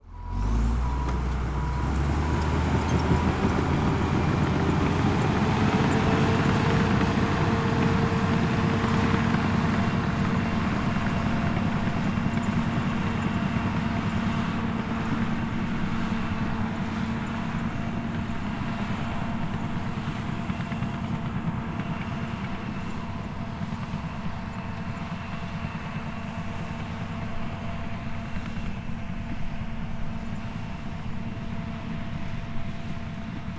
Transportation Bulldozer Driving Backing Up Away From Mic